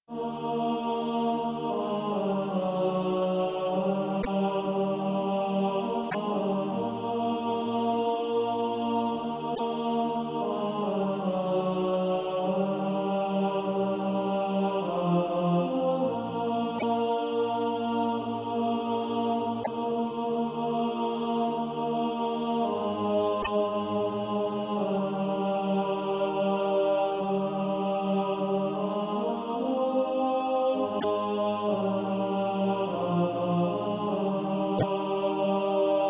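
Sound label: tenor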